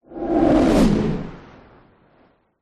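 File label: warfare missile rocket fly by fast powerful before impact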